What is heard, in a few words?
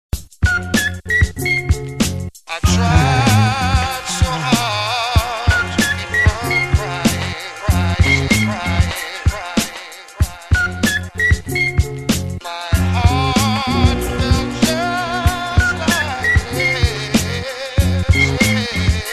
beat